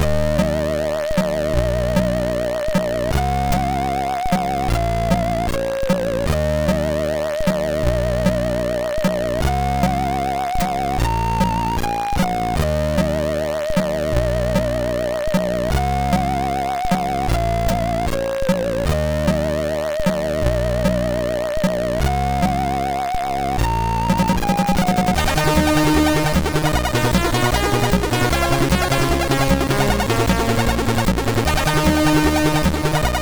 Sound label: Blizzard part, MOS6581R3; sid